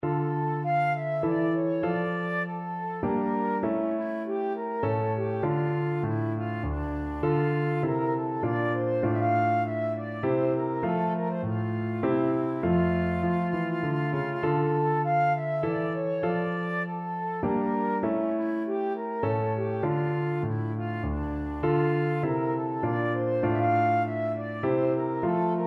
minuet in d minor, flute

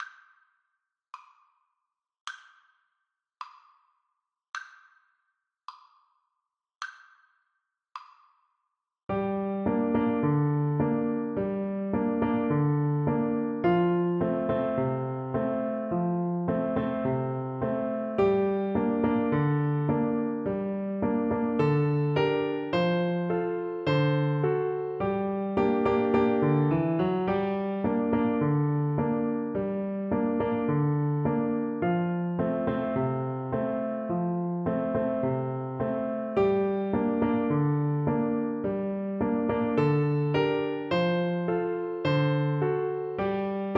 the auld grey cat, Flute

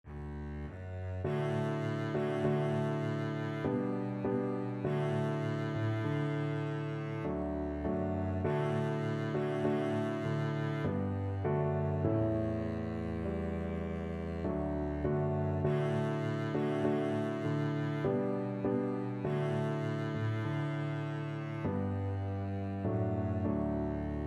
kumbayah, Double Bass

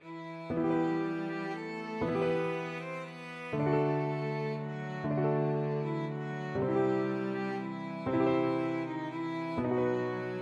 greensleeves piano trio

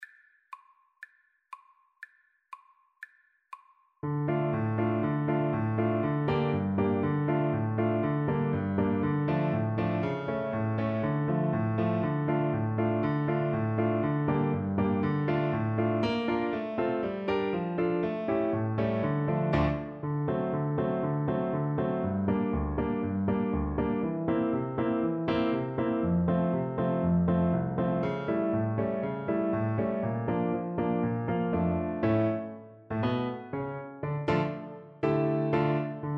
Piano Duet

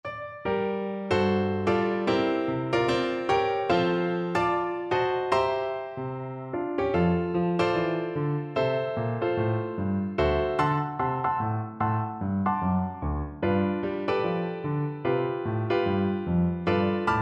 Clarinet

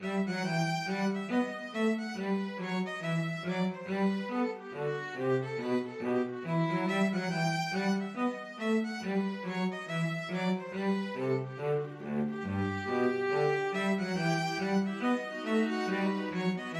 bouree, string quartet; bach

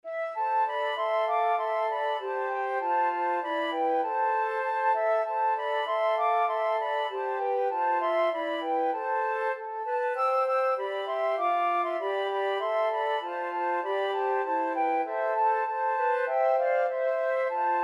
huron carol, flute trio

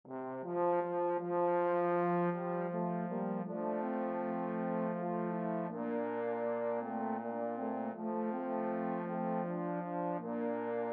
Shenandoah, trombone quartet

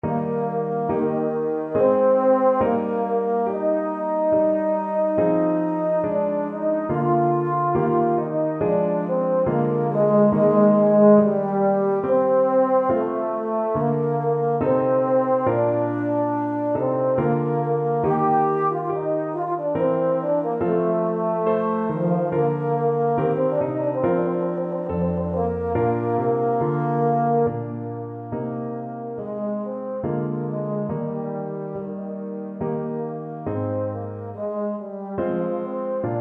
EUPH, euphonium

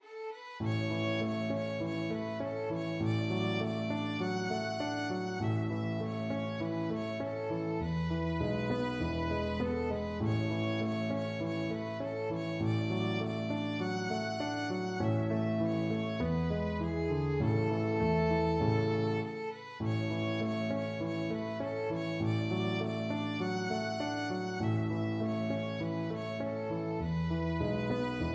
the possums tail is bare violin

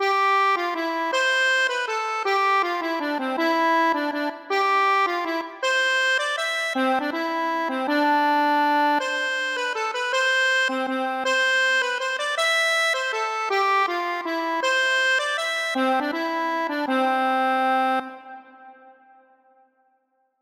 accordion

